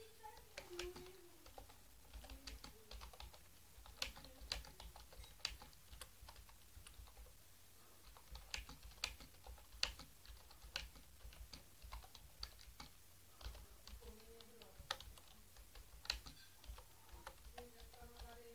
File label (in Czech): Genius i200, klávesnic; Genius LuxeMate i200